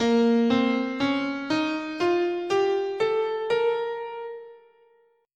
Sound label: Bb Melodic Minor, scale